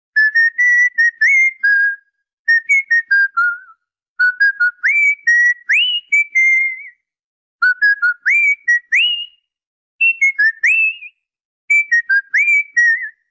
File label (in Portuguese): Assobio para Calopsita